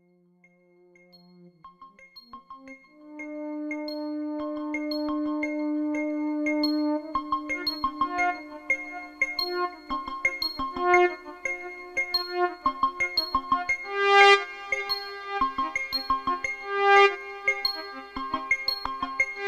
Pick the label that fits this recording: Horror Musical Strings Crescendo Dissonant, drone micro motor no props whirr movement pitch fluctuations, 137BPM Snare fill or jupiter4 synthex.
jupiter4 synthex